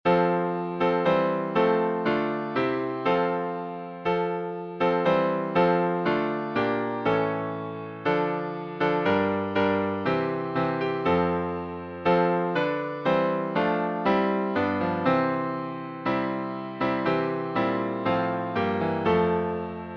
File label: Hymns